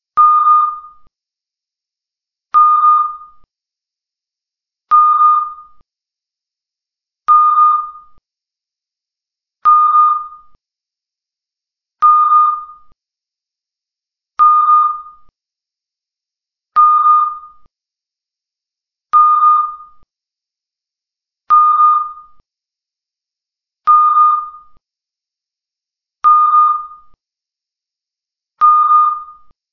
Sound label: beep beep